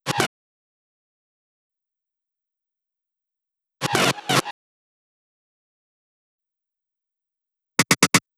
Synth Fills f#, Drum Loop 128BPM; VEC5 Drumloops; VEC5 Multi Loops; Loop Elements

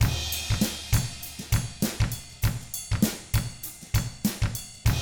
FUNKY, Drums; ILIO; Double Platinum Drums 1; 99 FUNKY 2

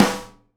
SNARE RIM, Live Snares; E-MU Producer Series Vol. 8 – Platinum Phatt (CD 1)

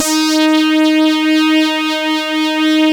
SYN Saw Lead D#4, SYN JD; Best Service Gigapack 1

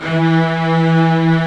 ARCO STRINGS; OMI - Universe of Sounds

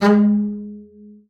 G#3, bass spicc; Xsample VOL-5

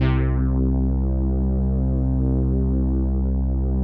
505, String Machines

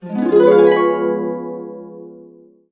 DB, Harps; USB Soundscan vol.62; AKAI

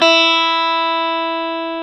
Classic Guitars

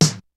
KICK SNARE; Kid Nepro; Elite; KICKS SNARES

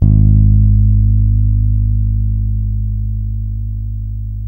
SOUL G#2, bass; Spectrasonics; Bass Legends

